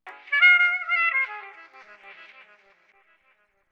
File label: Stab; Thirty-One Flavors